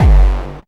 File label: KICK hardcore, Techno Trance DRUMS